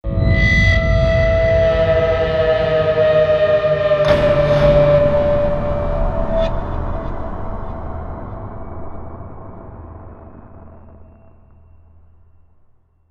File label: Death jingle